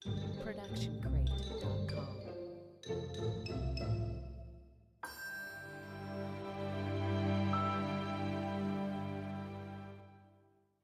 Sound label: Ident Xylo; SoundsCrate